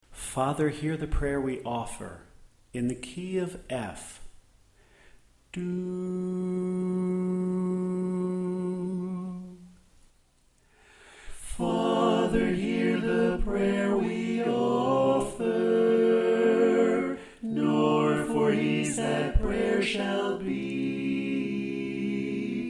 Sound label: Alto Left